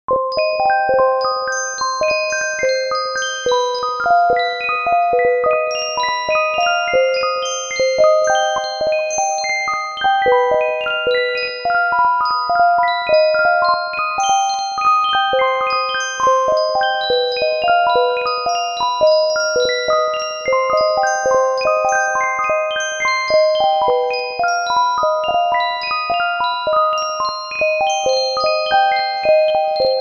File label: shattered music box